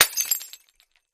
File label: Bottle Sml Crash